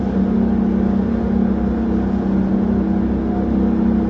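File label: laundry tunnel ambience; hl2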